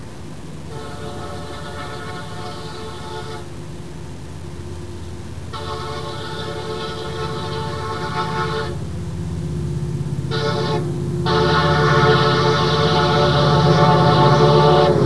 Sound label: Diesel